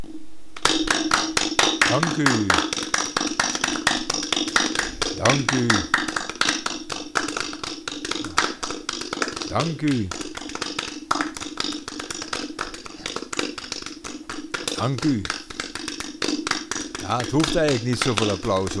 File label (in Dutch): applaus met grapje